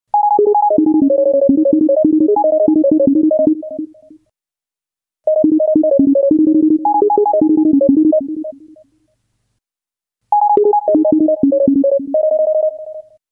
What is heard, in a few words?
business melody